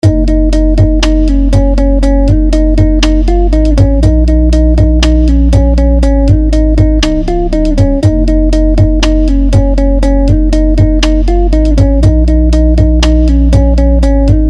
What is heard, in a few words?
Master R n B Contemporary